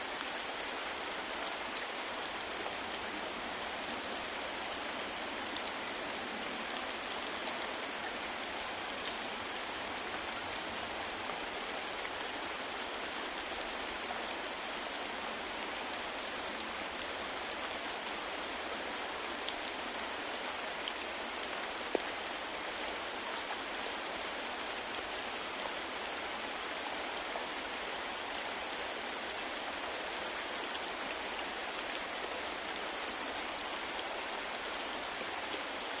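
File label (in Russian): средний дождь по листьям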